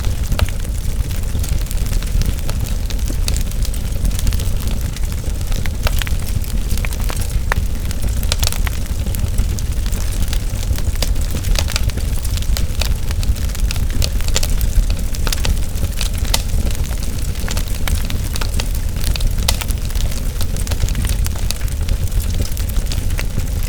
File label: Fire Pillar Loop; Ambience; Props; Warframe; Tau; Circles Of Hell